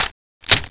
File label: clip in; cso; x-90